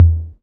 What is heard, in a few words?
deep tom sound e key, tom sound tuned to the E note. Loudest frequency: 106Hz